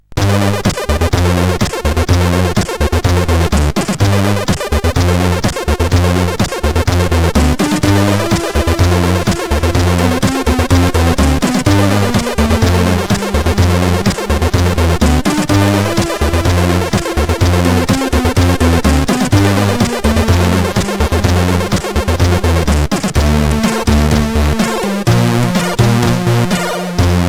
Trebbler, sid CSG8580R5

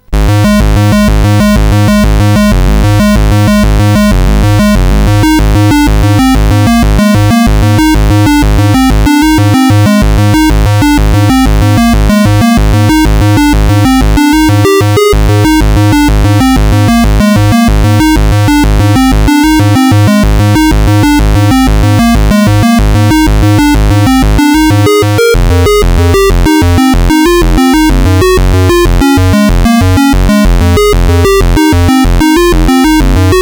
256 bytes sid CSG8580R5

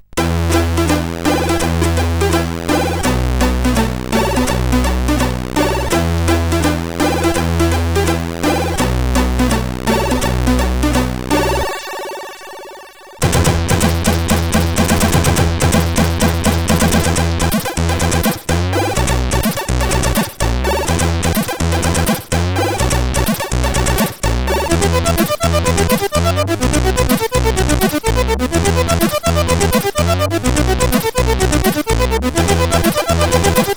Spinnin Violin, sid CSG8580R5

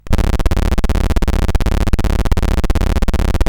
CSG8580R5, GAMES; Street Rod

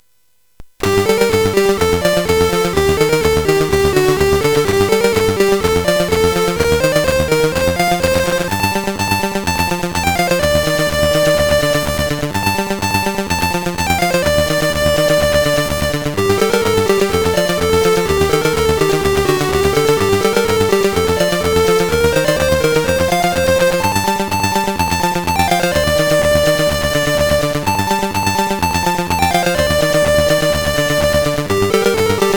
sid, CSG8580R5

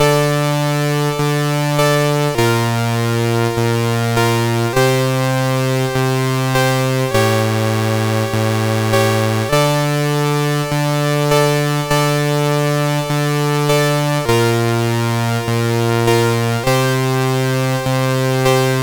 CSG8580; CSG8580R5

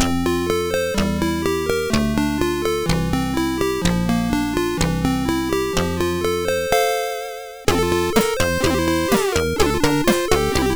Keyboard MOS6581R4

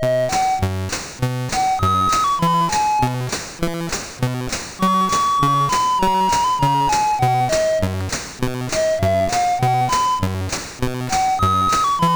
Synth Sample III, sid CSG8580R5

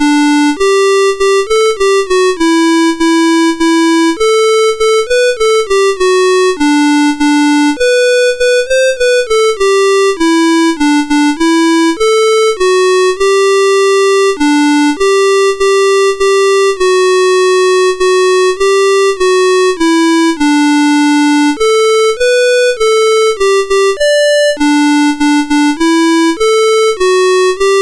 We wish you a Merry Christmas, MOS6581; Suicidal Software

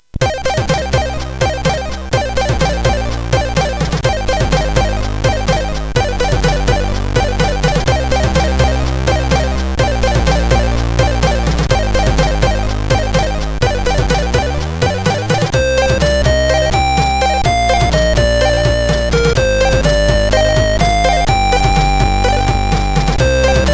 Combat Zone, sid CSG8580R5

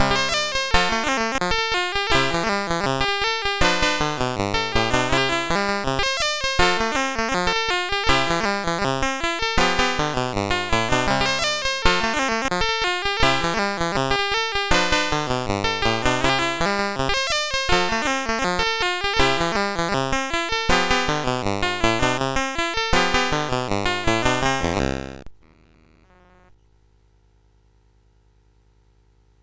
Blue Grass Music BASIC, CSG 8580; CSG8580R5

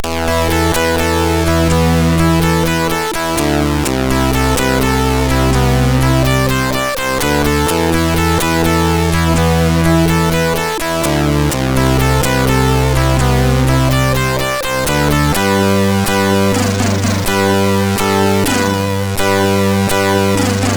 Title sid MOS6581R3; SoedeSoft

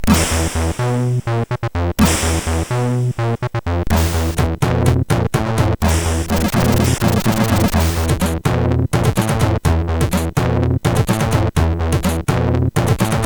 Introtune, MOS6581R4; F4CG

